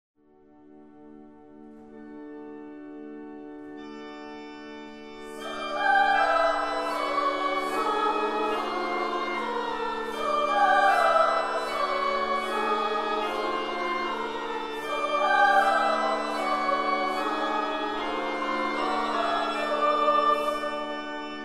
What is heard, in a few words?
Chorus; Missa Brevis Sanctus Benedictus